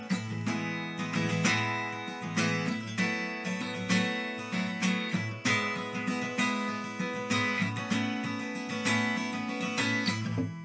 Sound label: Cajon